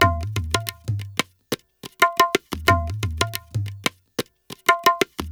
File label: ETHNO EXTRA 90; ethno2